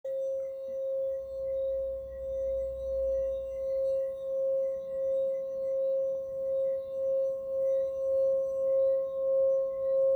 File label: Beaten Gold C Root, meditation singing bowl